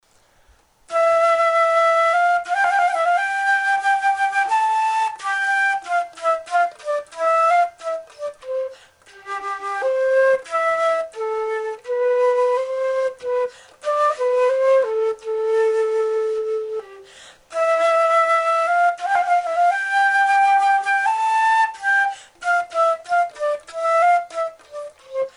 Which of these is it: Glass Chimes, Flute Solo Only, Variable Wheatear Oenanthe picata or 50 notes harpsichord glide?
Flute Solo Only